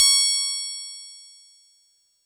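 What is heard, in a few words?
Fuzz Clav DX100 C#1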